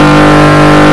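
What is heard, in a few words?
240zg on mid,car engine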